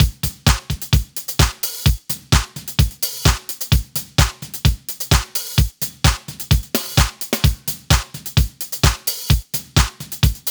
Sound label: 129BPM Hiphouse Kit